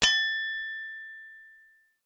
impact metal light small scraper

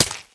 Stab Knife; little robot sound factory

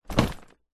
foley luggage suitcase set down carpet; zapsplat

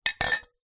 household plastic wine glasses bang together

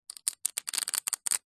poker chips stack quickly on hard surface

